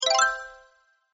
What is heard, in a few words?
bar filled; Skillzgaming; fruit Blast 92 Desktop